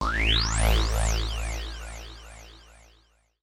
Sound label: KEY SHOT TEKNO 140 A; Techno2; Singleshots